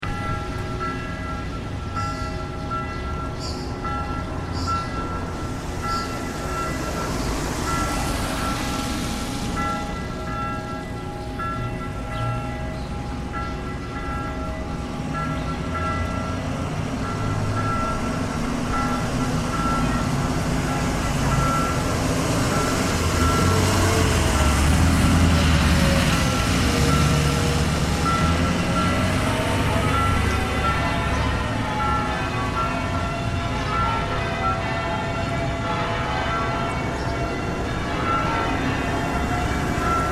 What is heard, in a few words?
Holy Trinity Bells